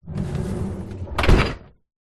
household dishwasher door drawer slide close; zapsplat

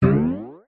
cartoon impact or bounce; zapsplat

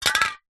industrial can wd40 drop fall over floor

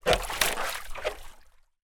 surfboard lift up out of water

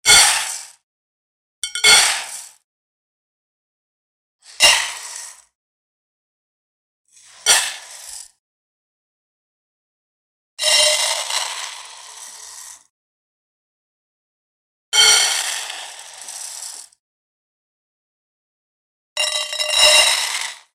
food dry rice pour into pot many times; sound effects one

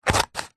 scissors single cut card; office

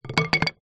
foley wood small pole down on wooden decking; zapsplat

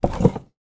household dresser drawer wooden small close slide

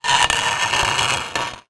metal pole small scrape tiled floor; zapsplat

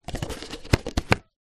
drink empty take away coffee cup plastic lid on

